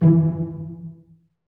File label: STR SEC, STRINGS; Roland - LA Composer vol. 1